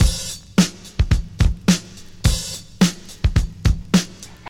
107 bpm breakbeat d sharp key, kick tuned to the D# note